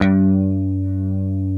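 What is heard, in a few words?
ESP G3, chorus guitar; East West - Global Sounds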